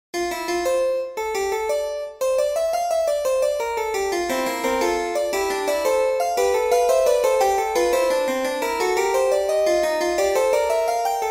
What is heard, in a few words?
harpsichord; F Fugue